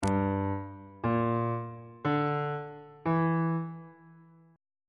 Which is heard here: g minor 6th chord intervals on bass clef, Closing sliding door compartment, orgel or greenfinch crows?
g minor 6th chord intervals on bass clef